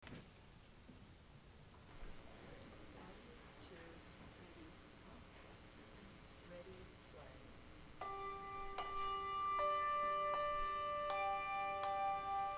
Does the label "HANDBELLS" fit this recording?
Yes